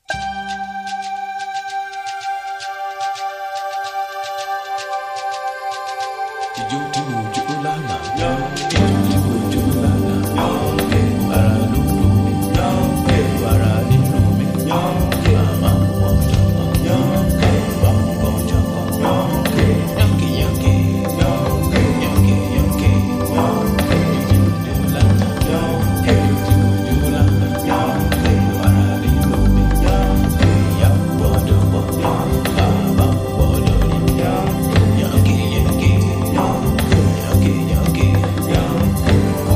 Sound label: Rhythms